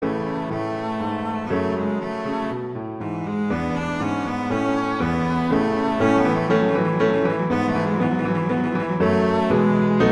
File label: Tempo di minuetto; Lanzetti; Sonata